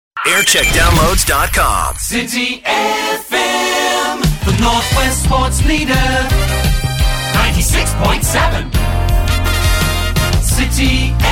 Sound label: Jingle Montage; Radio City; City FM; JAM